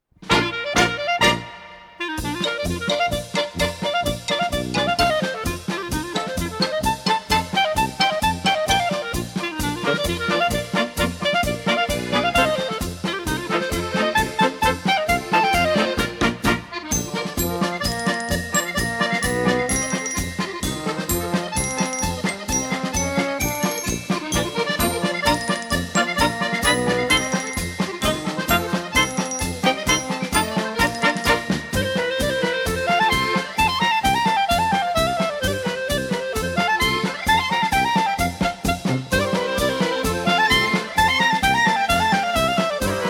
German Band, Clarinet Polka

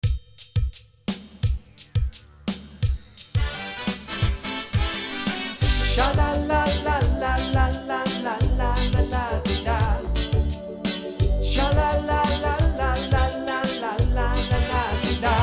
Caribbean Band

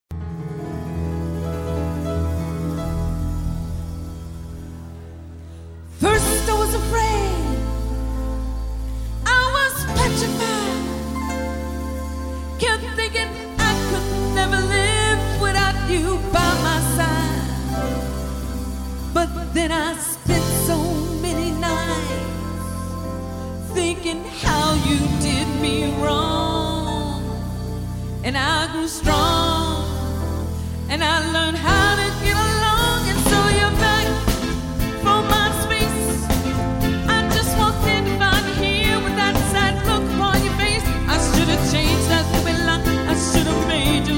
Variety Band; Raleigh

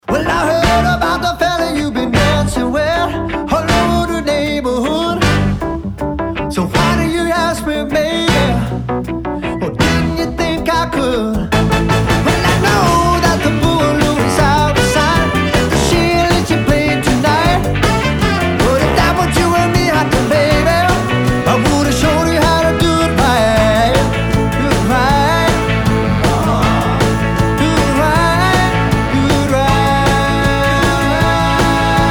Wedding Band; cover band

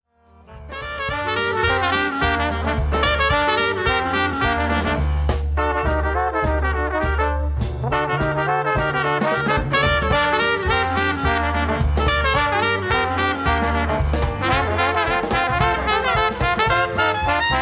Dixieland Band

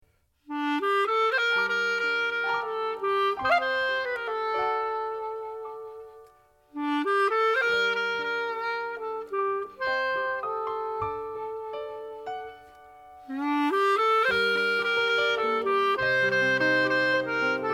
Dixieland Band

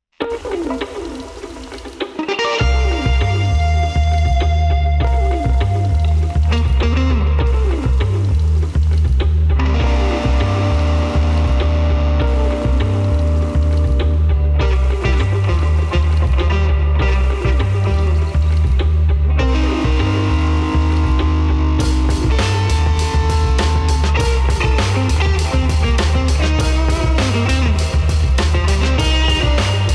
Latin Band; Miami Latin Band

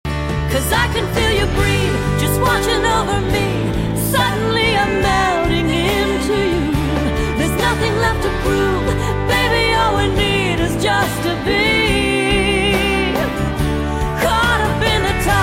Country Singer

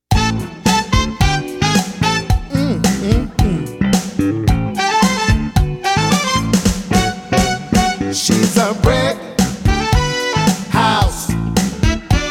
Variety Band

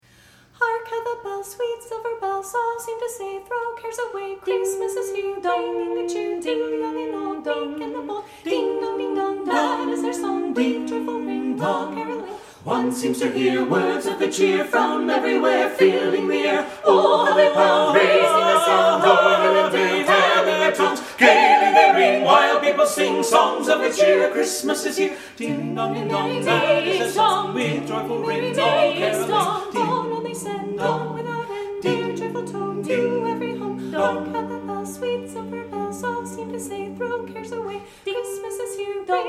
Carolers; Carol of the Bells

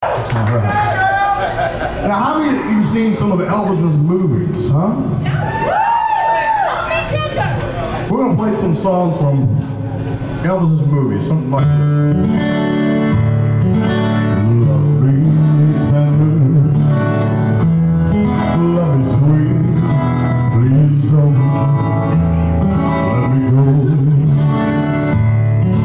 Impersonator

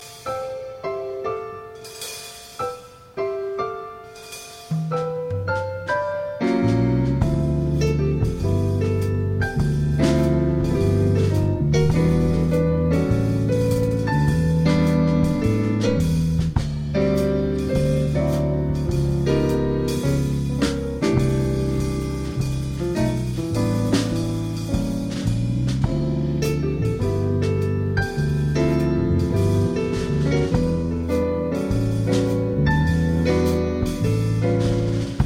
Jazz Band

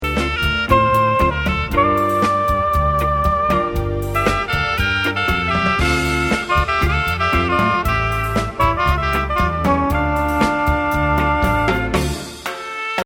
Jazz Saxophonist; Albuquerque

